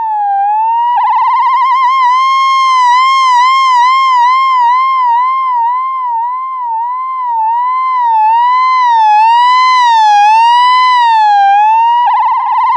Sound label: MAD SIREN; Zero-G Ambient 1